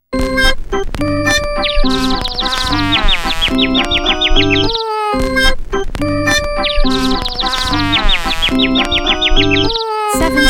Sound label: Fun time loop